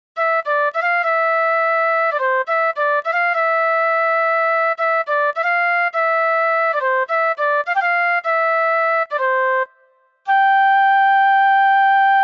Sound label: Flute